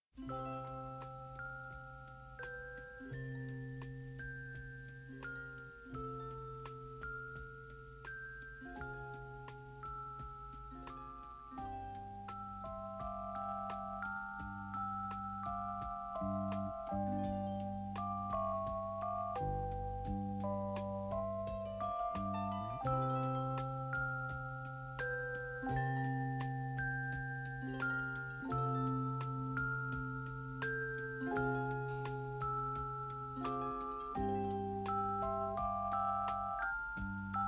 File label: instrumental